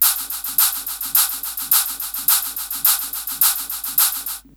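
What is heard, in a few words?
hi hat loop; USB Soundscan vol.31; Miro s Grooves; AKAI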